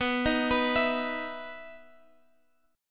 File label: B Major Add 11th B, chord